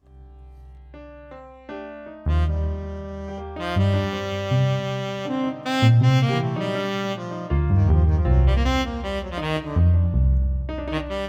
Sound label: Jazz Miniature